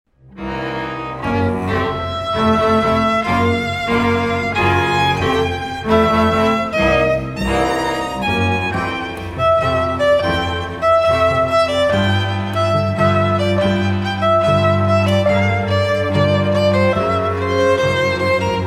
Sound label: string quartet